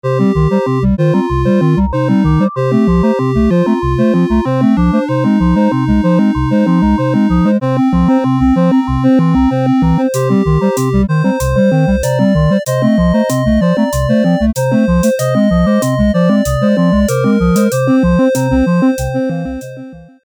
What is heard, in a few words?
Synth